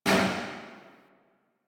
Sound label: Tail Generic Interior Medium, Weapons